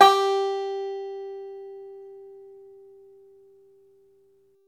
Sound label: FLATTOP, Flat Top Banjo